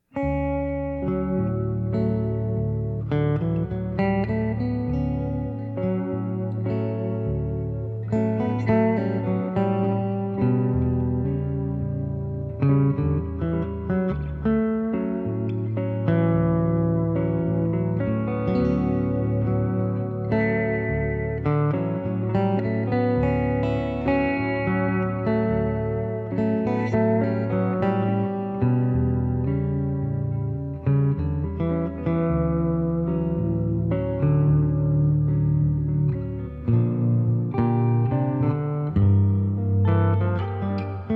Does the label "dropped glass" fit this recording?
No